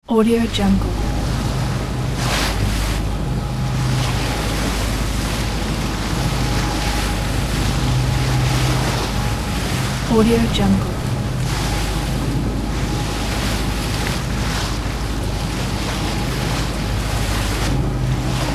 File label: on board ocean fishing trawler